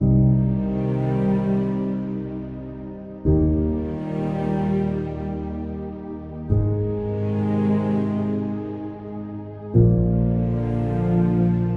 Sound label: Documentary Film